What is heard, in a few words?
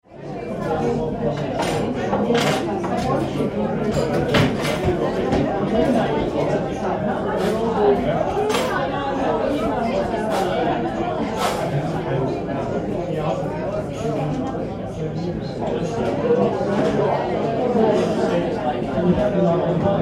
Cafe Chatter Interior, Mixed chatter and activity atmosphere; Cafeteria; Tom Hutchings